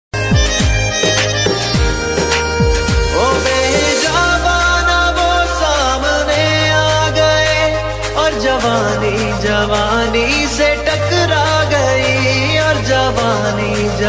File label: romantic song ringtone